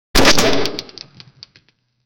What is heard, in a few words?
a broken lamps back and